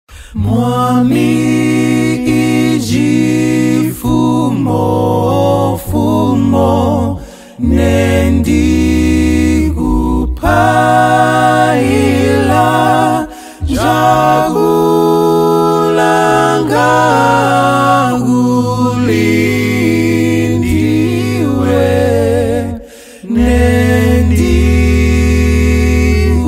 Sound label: Bass Quartet, Hymn